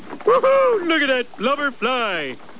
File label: Blubbler